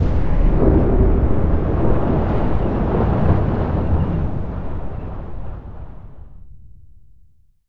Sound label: transition To Free Spin Intro; netent